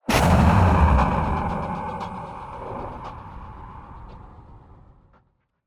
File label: Stutter Jet Accelerate, Futuristic Vehicle; Sound Spark LLC; Hyperdrive